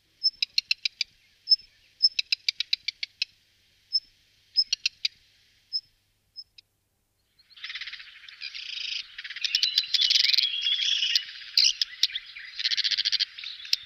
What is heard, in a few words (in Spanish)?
Phoenicurus ochruros - Colirrojo tizón, aves